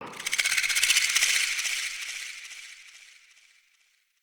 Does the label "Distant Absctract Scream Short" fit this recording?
No